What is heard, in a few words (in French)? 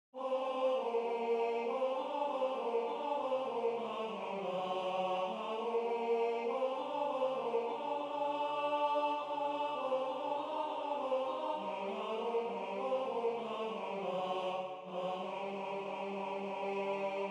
tenor